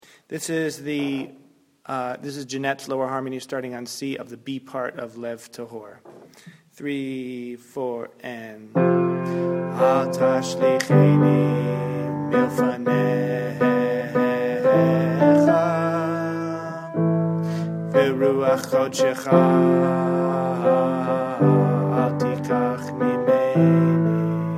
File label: low harmony